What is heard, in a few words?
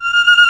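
Chamberlain voice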